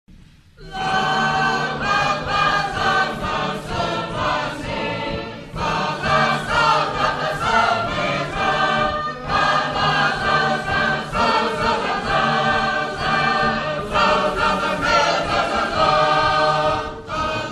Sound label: Sacred Harp; Portland; 2006 Convention; Panting for Heaven